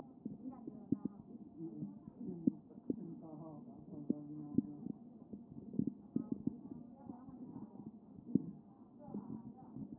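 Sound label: anterior chest, position 1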